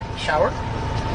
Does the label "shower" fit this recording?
Yes